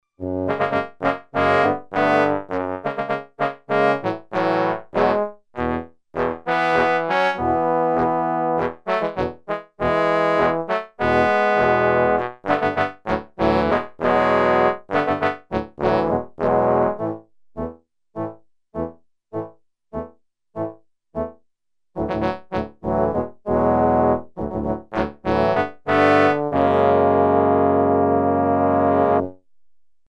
Trombone song

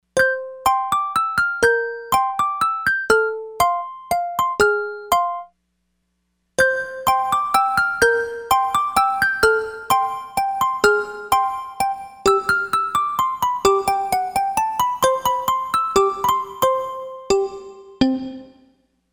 MEDIUM ROOM

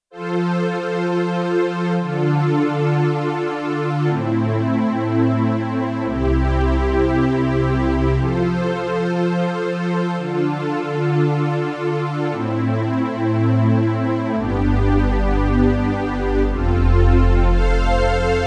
Roland D-70 JP-Strings; Factory Performances

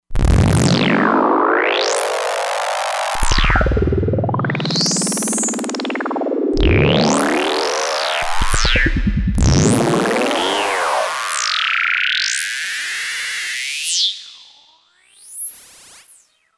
Circular

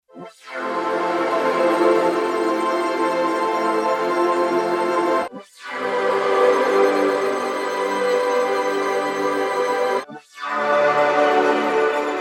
Mystery Stck, synth

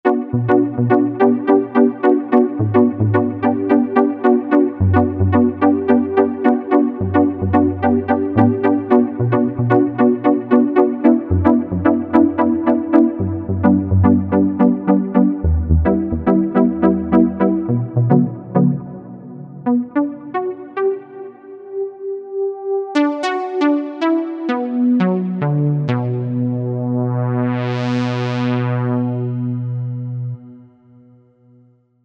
Space Bleep

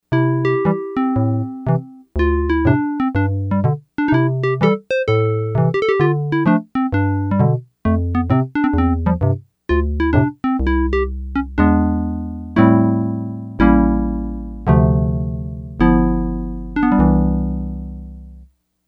Wood Piano, Yamaha DX100; Factory Patches

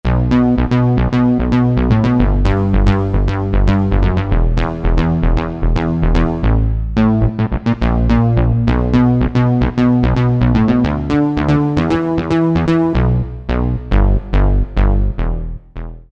Syn. Bass, Korg MSC-2S Synth; Synth 1

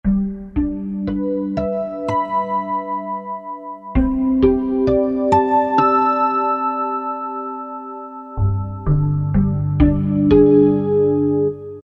Harmonics, Korg T3

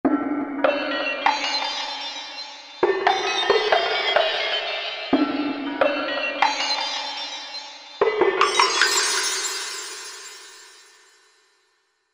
Clavebells, Korg MSC-8S Percussion

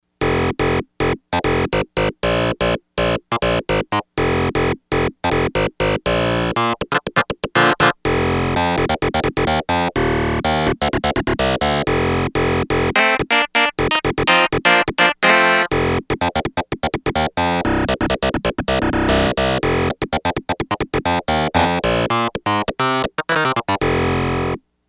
Fuzz Clav, Yamaha DX100; Factory Patches

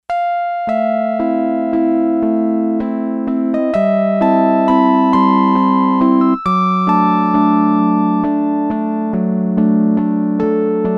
Yamaha DX11 Old Rose; Factory Preset Voices

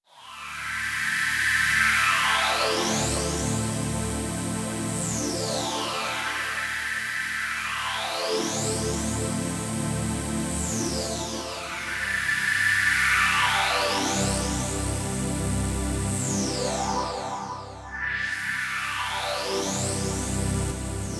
Mod Sweep, Korg Radias-R

